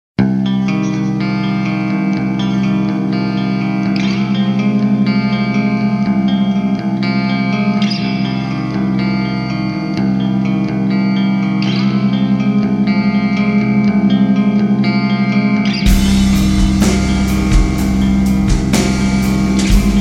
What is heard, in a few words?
viola